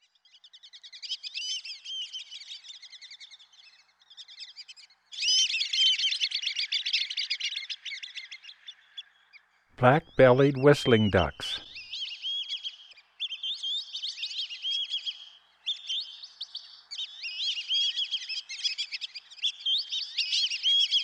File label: black bellied whistling duck; dan gibsons solitudes